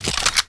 ui equip blaster

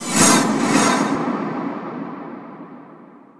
bleeding on

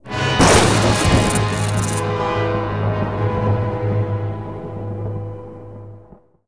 skill qualify

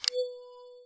ui toggle mouse mode

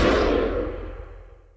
force generic end

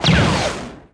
probe fire

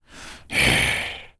afflicted aggro

